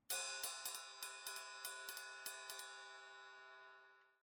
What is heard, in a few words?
Ride